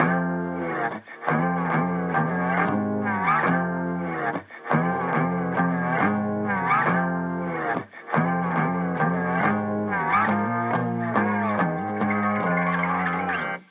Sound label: digital blues